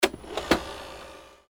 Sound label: copy machine